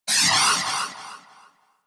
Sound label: Iron Blast Cast Fully Charged Proj Explosion Sweetner; Warframe; Warframes